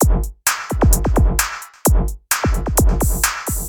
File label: Electro Break